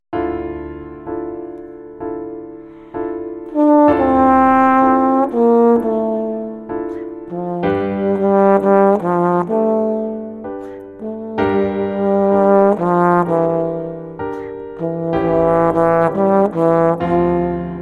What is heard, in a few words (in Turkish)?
(Trombone)